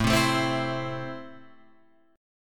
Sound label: A Minor, Guitar, Open F; Am strummed; 9,7,9,7,7,7